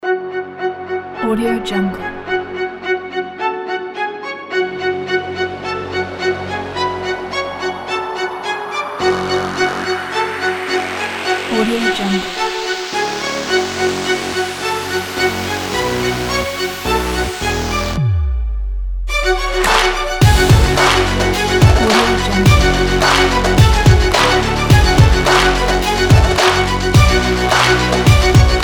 intro sport kit

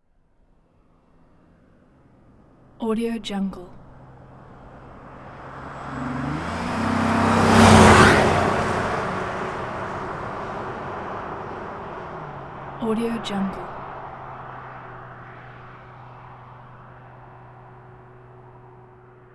1997 dodge intrepid; prosoundfx

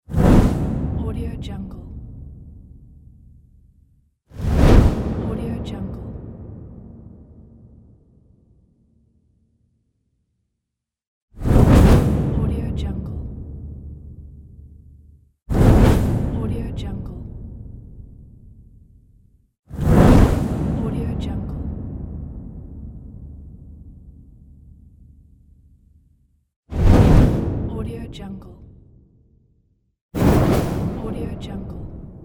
whoosh

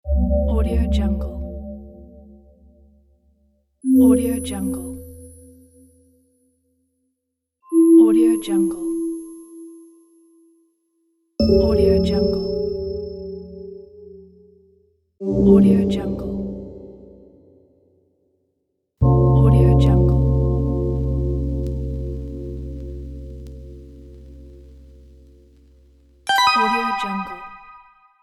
magic mystery

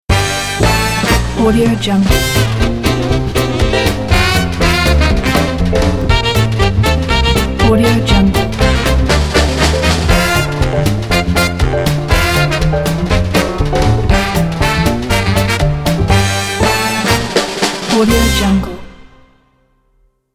fast swing ident; loudvibe

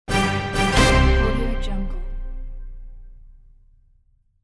orchestra hit

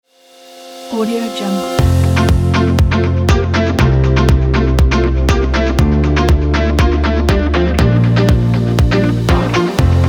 urban modern

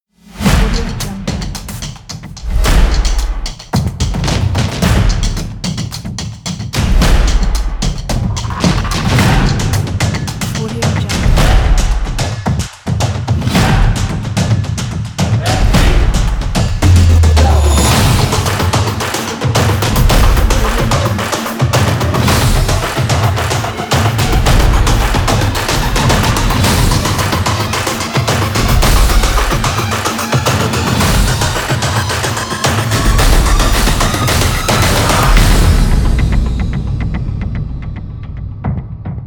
epic big percussion

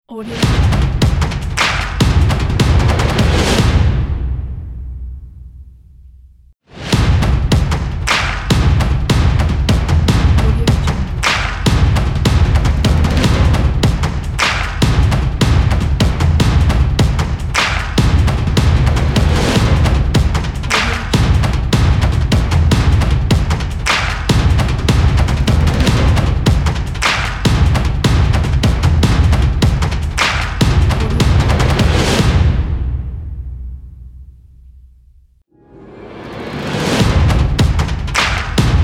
drums and claps logo; plaincask

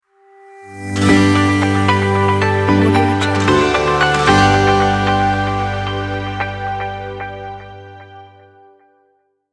corporate ident upbeat; densostudio

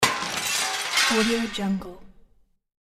glass break; hollywood edge

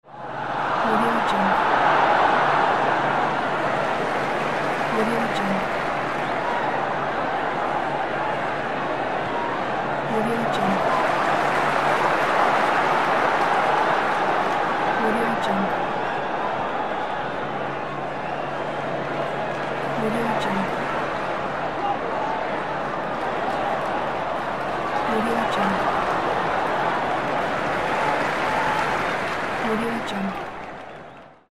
stadium crowd

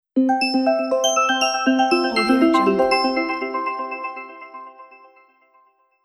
carefree logo

